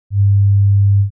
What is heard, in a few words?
sine 100; praat; understanding waveforms; standard waveforms